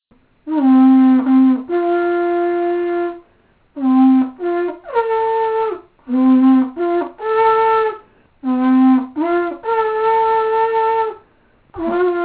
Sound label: taps